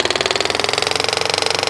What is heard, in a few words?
Restore up; Dune2000; Desktop Themes